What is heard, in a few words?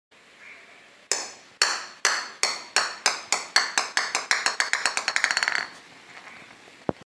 plastic ball